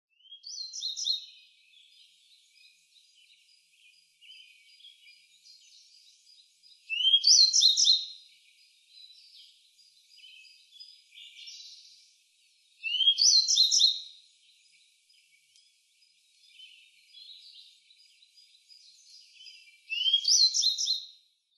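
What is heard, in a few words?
kuroji, Grey Bunting; nasushiobara; koe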